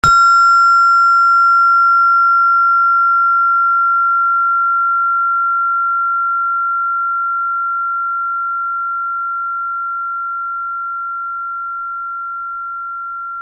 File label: space-bar-platonisches-jahr